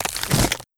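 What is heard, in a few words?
Skeleton Dog Bite Crack; Legend of Keepers (gamerip) (2019)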